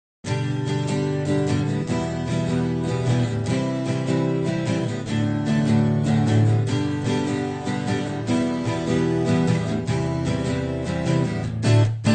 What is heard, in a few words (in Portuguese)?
acustico